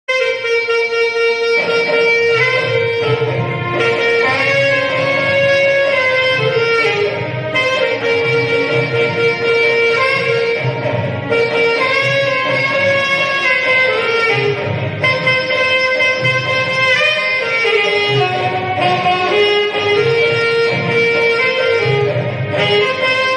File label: sad ringtone love ringtone emotional ringtone short instrumental ringtone